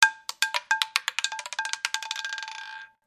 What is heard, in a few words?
messagetone; Nokia 6270; Casino